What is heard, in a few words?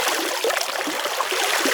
Loop Water; KriptoFX; Realistic Effects Pack v1